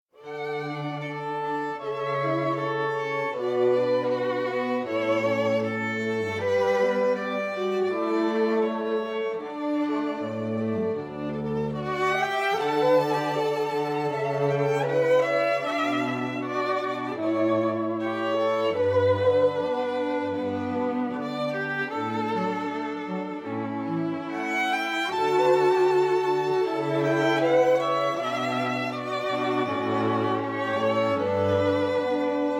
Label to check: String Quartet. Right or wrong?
Right